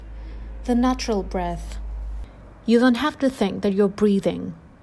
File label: natural breath